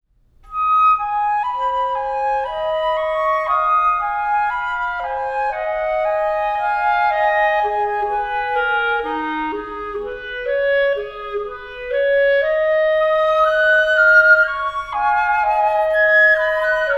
duos for flute and clarinet, moderato

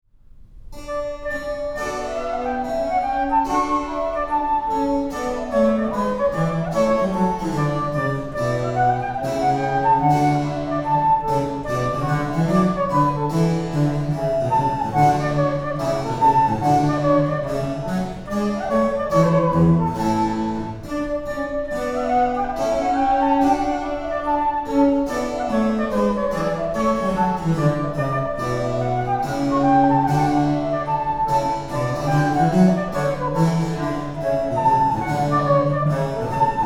sonata in d for flute tempo di gavotta

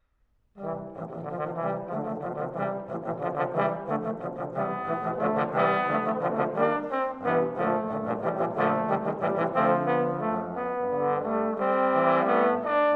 trombone quartet valse

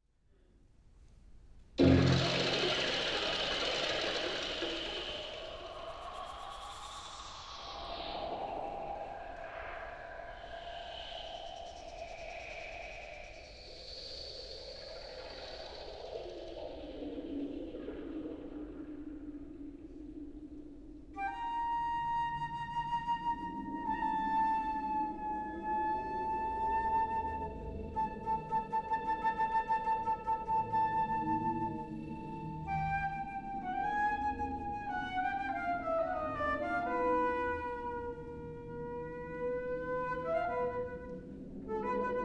rain dance for flute magnetic tape

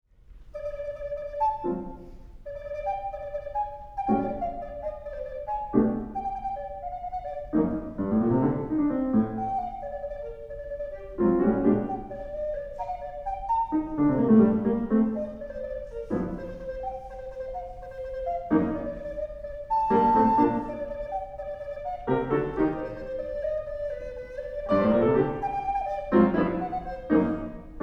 three contrasts for native american flute

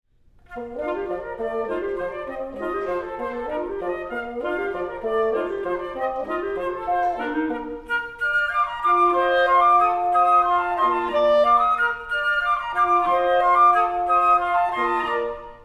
suite for woodwind quartet final dance

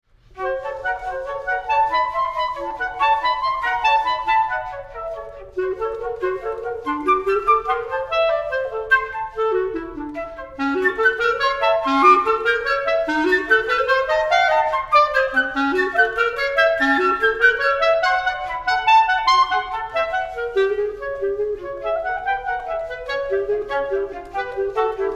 duos for flute and clarinet

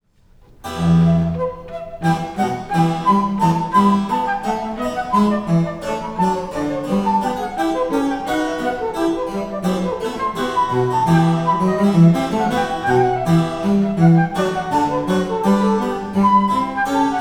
sonata in e minor allegro

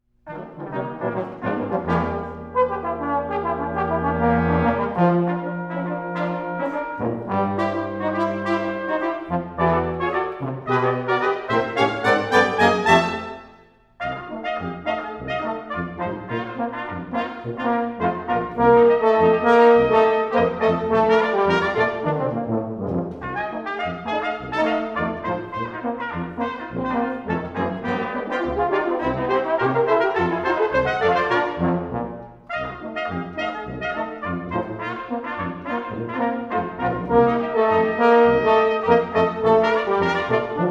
ragtime

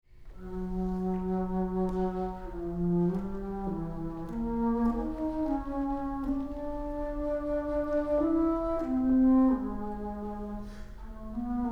flute suite antiphony

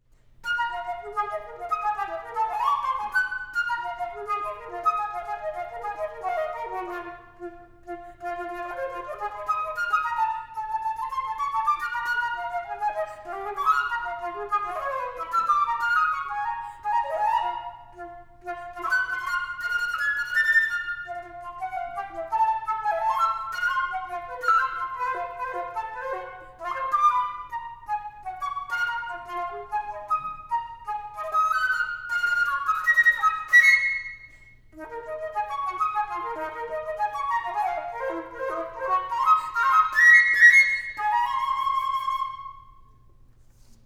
solo flute; three preludes for solo flute

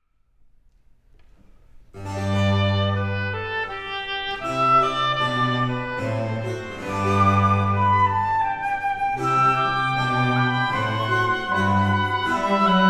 trio sonata andante

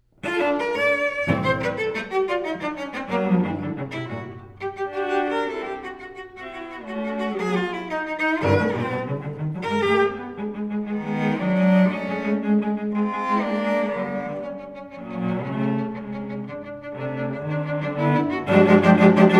inventions for two cellos vivace

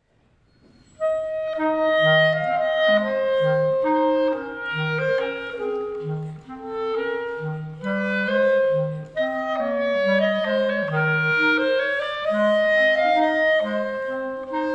siciliano

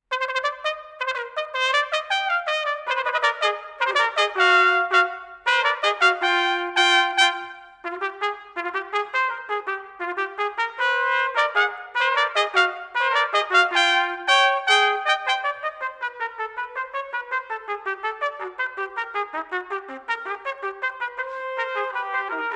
fanfare

